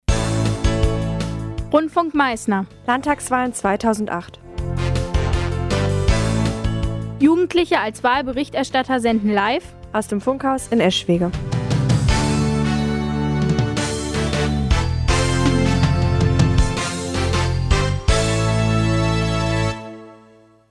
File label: JINGLE